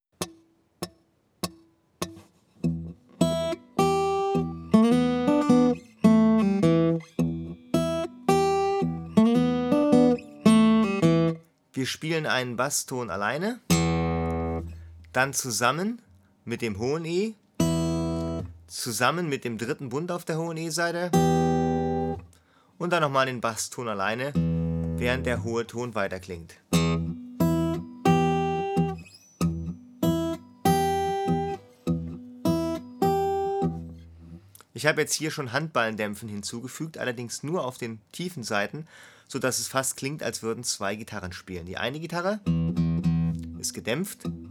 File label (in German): One Chord Vamp mit Steady Bass, Bluesgitarre; Akustik Bluesgitarre; Garantiert Akustik Bluesgitarre Lernen